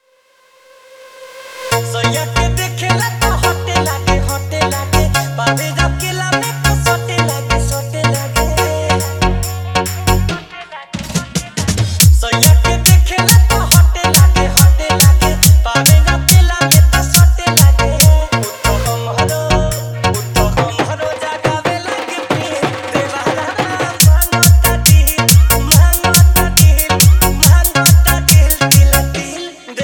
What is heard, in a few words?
New Trending Viral Bhojpuri Dj Remix Song Hard; Edm Circuit Mixx